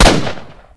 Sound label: fn2000 shoot, weapons